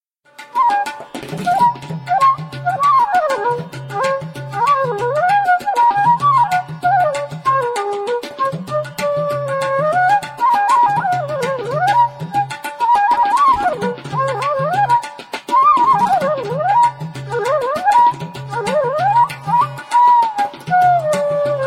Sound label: tenjiku shakuhachi